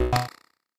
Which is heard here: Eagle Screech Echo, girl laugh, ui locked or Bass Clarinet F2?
ui locked